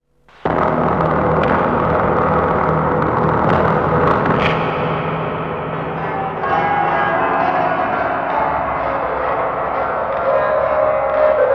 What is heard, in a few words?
fill